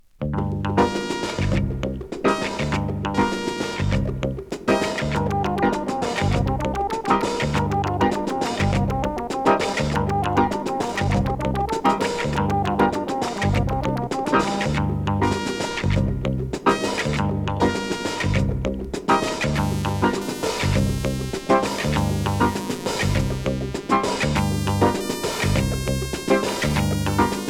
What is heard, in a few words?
Instant Percussion